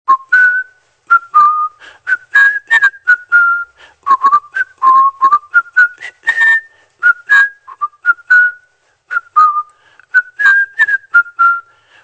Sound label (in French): sifflement